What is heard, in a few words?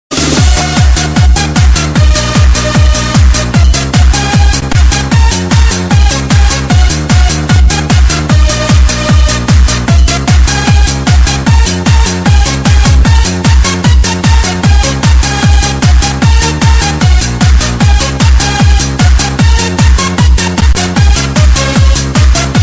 ling sheng dj